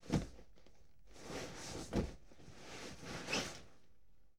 Bodies Scuffle

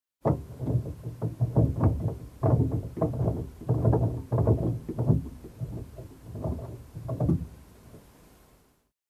Noises outside of car

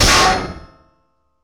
Heavy Metal Objects Collide

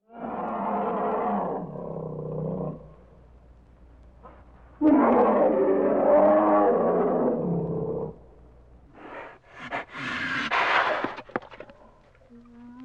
Angry feline roaring in cage long

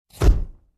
Wet Plate Camera Shutter

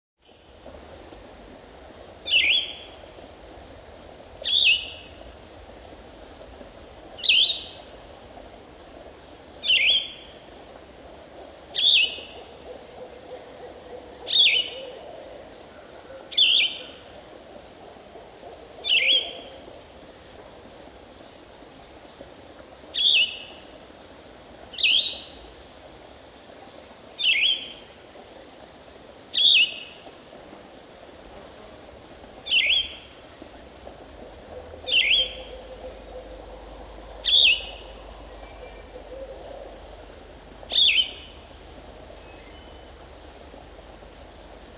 Calm Forest with single bird chirping

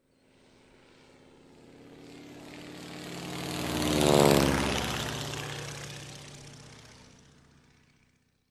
Light Aircraft on a runway whirring sound

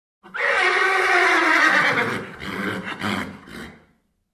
Horse Neigh with breathing

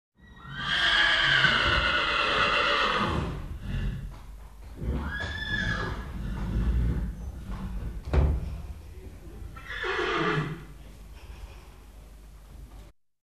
Horses Neighing

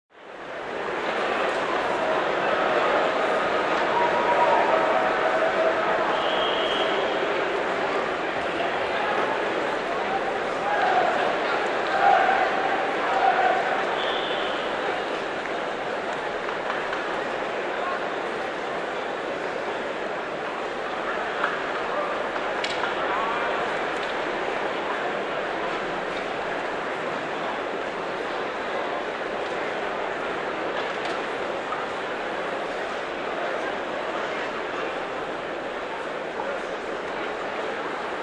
Crowd in a soccer field cheering

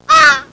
Single crow caw

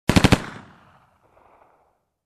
Machine gun firing short

